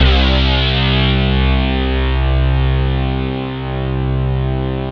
SYN BASS

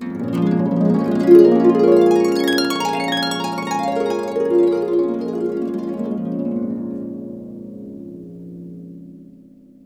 gliss sweeps, harp; InVision Interactive; Lightware VOL-1; Instruments & Percussions

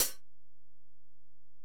HiHat, Alesis DM-PRO

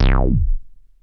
Bass Synths hits and octaves

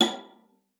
B5, VIOLA FINGER; Xsample VOL-5

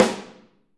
Snare OH; Snare OH All; Drums Percussion

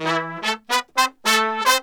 BR 110, BRASS MURGA; Best Service ProSamples vol.14; World Grooves; AKAI

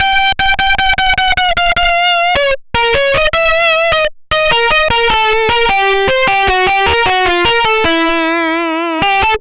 Disto Guitar, Guitar Synths; Dr. Fink's Funk Factory

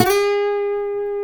MARTIN A 4A; MARTIN 6; Guitars

